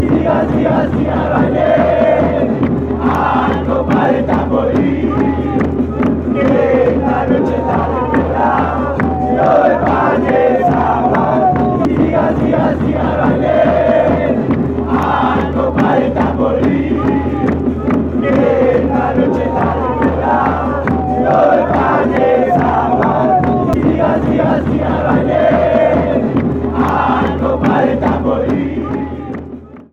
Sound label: chant, argentina; deportivo merlo